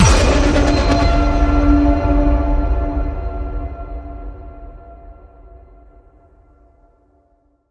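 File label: hit horror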